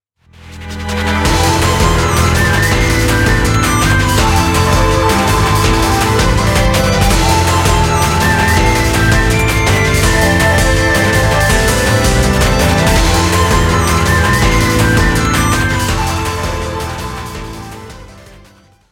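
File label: background music crossfade; Invaxion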